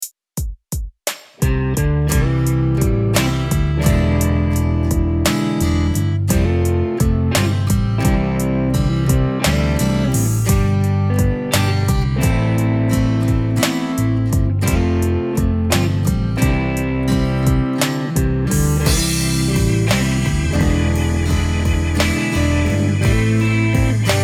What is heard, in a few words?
Jam Backing; GuitarTubers